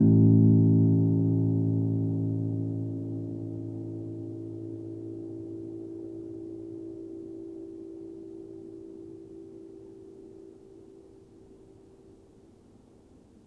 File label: Upright Piano; HSS OP Pack; healing soundscapes